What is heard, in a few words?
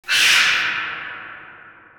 alert far, banshee; npc